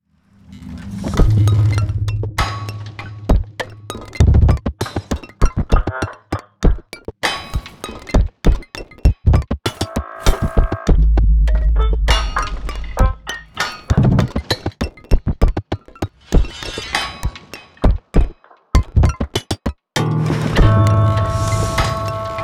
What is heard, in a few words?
Music Shop Room